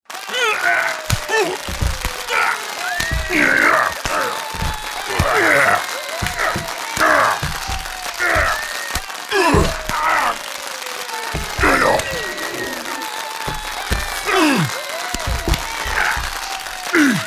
Wrestling, Wrestling match with two men with audience cheers; Wrestling and Struggling